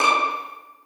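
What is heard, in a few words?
2nd violins pizzicato c#6; Sonatina Symphonic Orchestra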